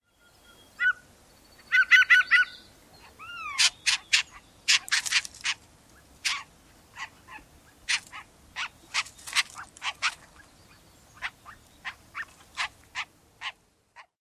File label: various calls